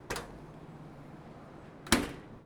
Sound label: Laundry Dryer Door Open